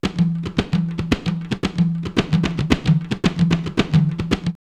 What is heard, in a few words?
Bedouin Break 112, drumloops World Ethnic; X-Static Goldmine